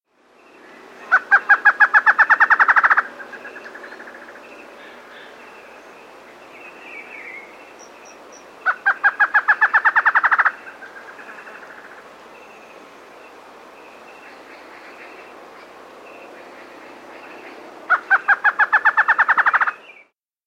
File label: Planalto Slaty-Antshrike; S. America